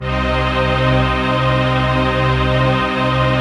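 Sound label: chord pad, Pads; Best Service; XX Large - Pads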